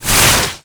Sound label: poison projectile passby